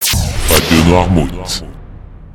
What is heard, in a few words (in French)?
JINGLE RADIO